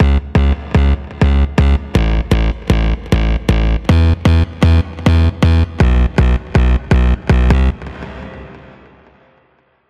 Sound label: BASS FUTURE